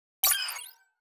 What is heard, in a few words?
game warning quick notification; mixkit